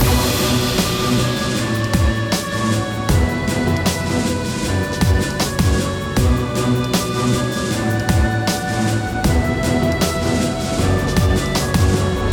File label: music; Castle Storm; brutus